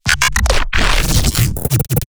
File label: glitch stab; Virtual Riot; Glitch Stabs